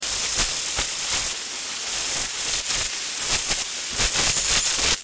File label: Electrical Static; electrical interference